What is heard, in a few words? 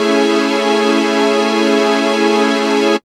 DR. LOOP; X-Static Goldmine 3